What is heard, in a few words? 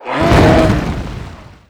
attack